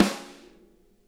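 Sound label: snare center; Sonic Reality; Interactive Drum Kits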